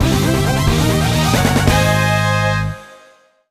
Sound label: Character Get Jingle; wii; We Love Golf